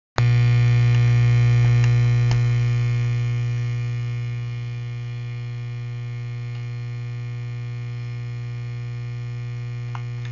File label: hum